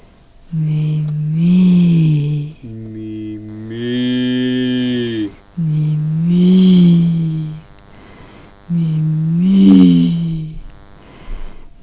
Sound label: Mobile device interference